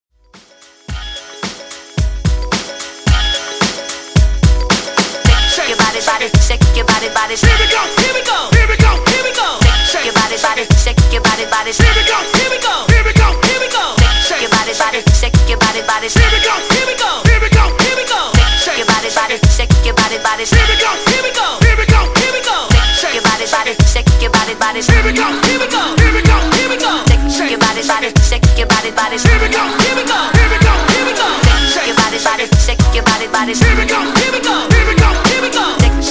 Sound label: 90s Hype Redrum 110Bpm